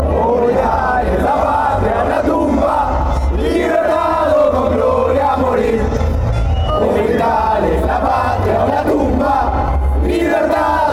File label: uruguay national anthem